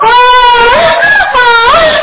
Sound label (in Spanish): gemidos; cstrike